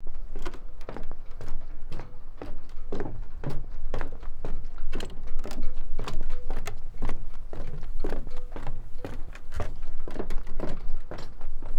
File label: Sailing